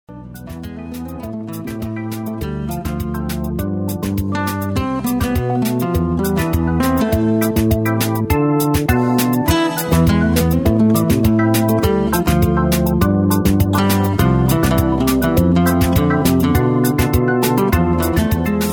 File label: latin irish, guitar